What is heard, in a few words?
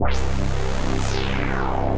Bass Space Bass; Drive and Glow; Ableton; Factory Packs